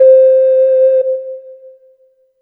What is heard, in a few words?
Kawai K5000W Ocarina C5